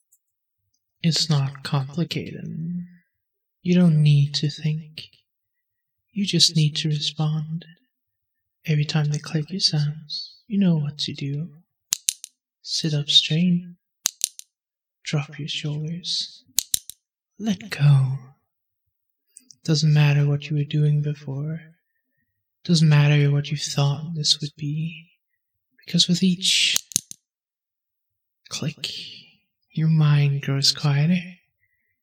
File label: Click, snare